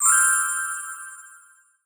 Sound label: button